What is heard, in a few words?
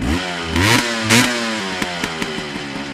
accel